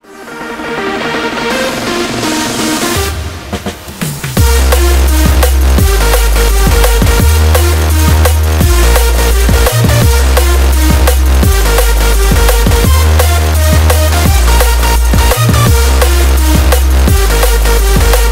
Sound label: siren beat hardstyle remix